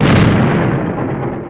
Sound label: Hit